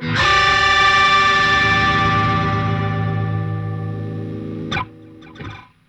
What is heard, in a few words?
Funk Guitar; 90 s Sample CDs; Zero G